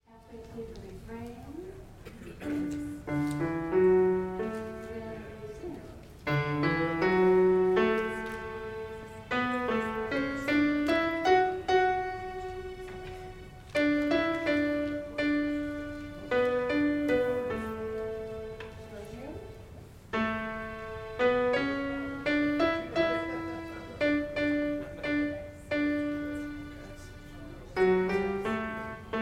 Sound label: tenor; Gather Hymnal